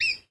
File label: AMB Village; gamerip